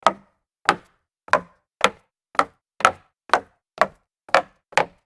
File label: jog down solid wood stairs